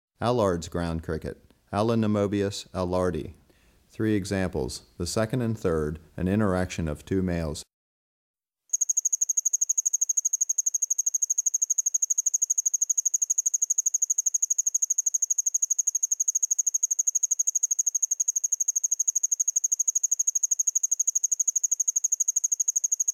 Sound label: Allard's Ground Cricket, Allonemobius allardi; Crickets and Katydids